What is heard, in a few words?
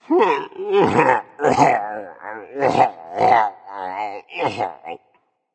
boohoo berserk; cuchulainn